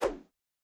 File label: Swing Small